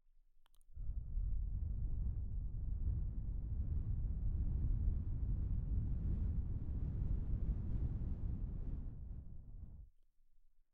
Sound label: quiet noise